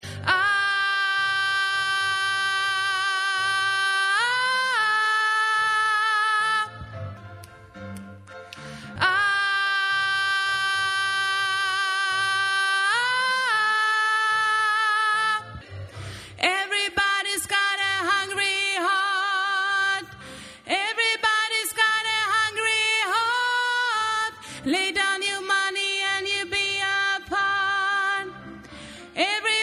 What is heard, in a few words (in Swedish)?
sopran